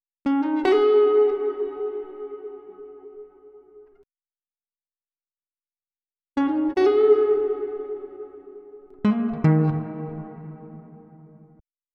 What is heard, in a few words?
157 BPM D# Min Guitar Lead, loop stems; Melody; Cymatics; 2022 Melody Collection - Various; Bellagio